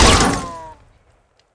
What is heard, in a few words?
metal, debris